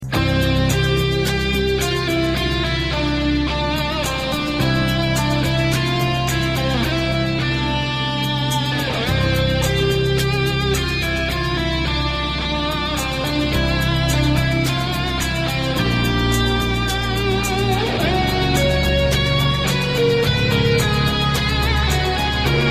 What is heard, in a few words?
english movie theme tone